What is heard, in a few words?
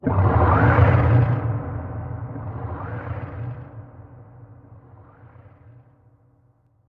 Creature